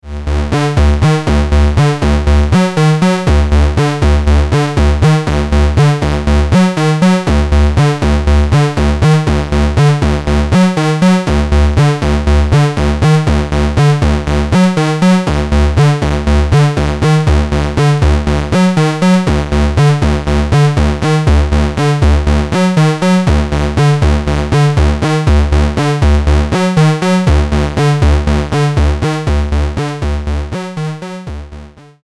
Active DI Pre Amp Tube

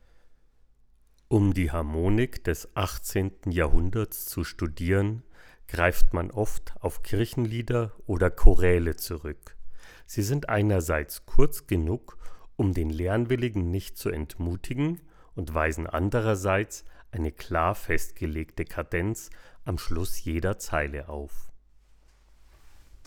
iCON Cocoon 10cm ohne Popp

